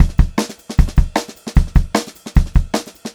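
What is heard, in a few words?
152 speed 2 variation, pop rock drum loops; USB Soundscan vol.37; AKAI; 90 s Sample CDs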